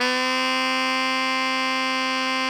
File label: ALTO GR C 3, Saxophones; Greytsounds; Philip Wolfe David Crozier's Saxophones